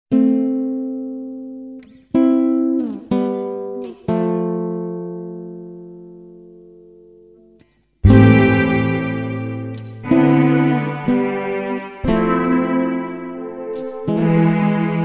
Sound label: heavy guitars